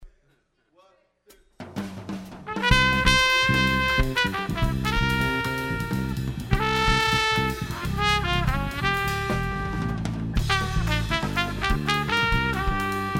Latin Trio

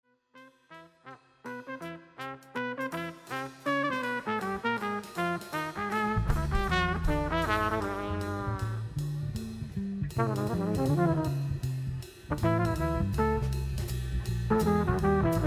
Acoustic Trio

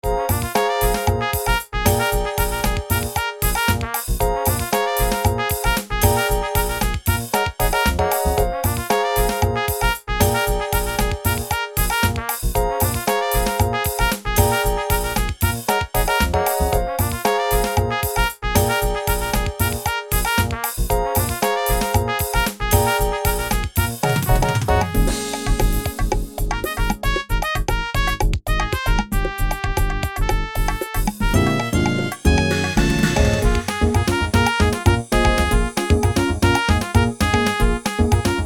Trio

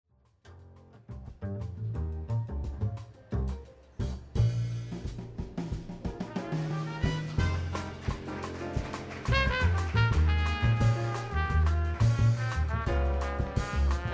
Acoustic Quartet

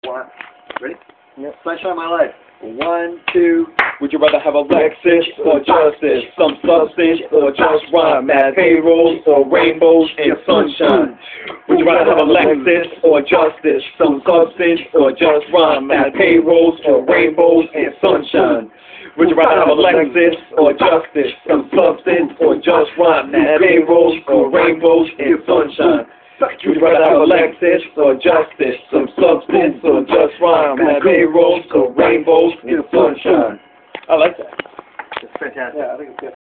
Gruv Vocal Rehearsal chorus

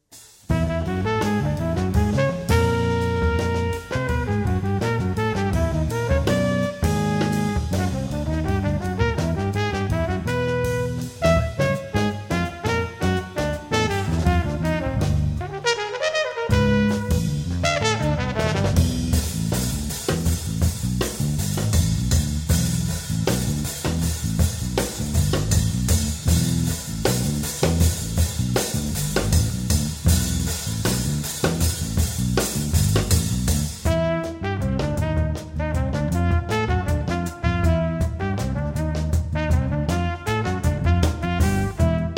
acoustic trio; Estonia

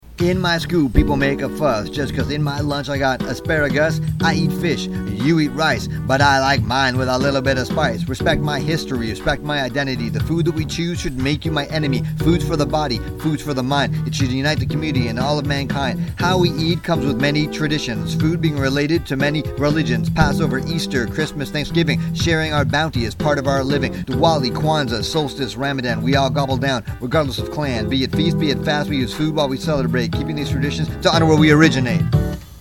Verse Rap; Peace Theatre

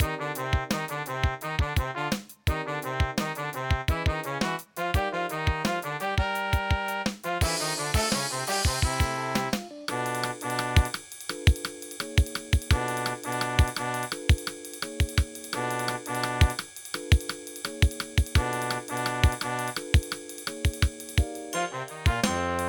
scr (No Bass)

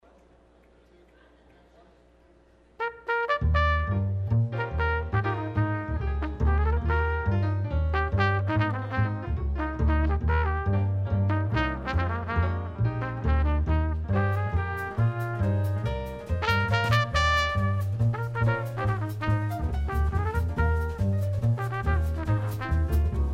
Acoustic Quartet

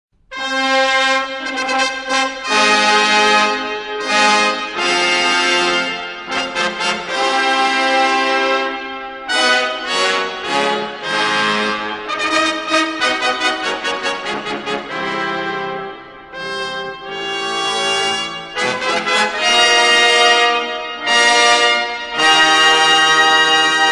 Royal Fanfare; Peace Theatre

